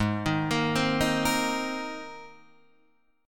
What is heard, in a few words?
Ab Major Add 9th Ab Guitar Open D